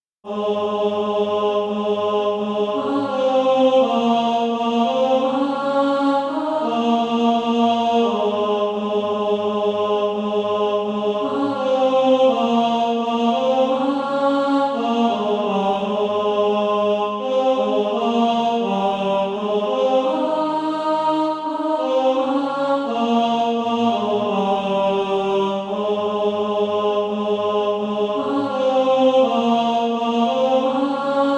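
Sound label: tenor; Easter Offerings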